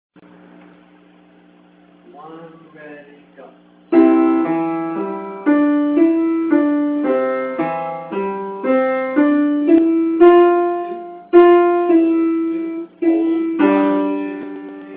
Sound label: The Spanish Guitar